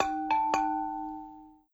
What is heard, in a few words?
Wine Glass Slow